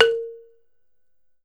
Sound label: BALAFON, African Melodic